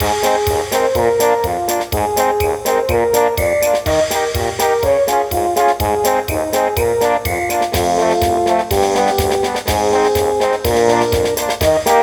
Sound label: CIRCUS